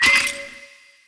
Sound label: respin block break fast; medallion; Fantasma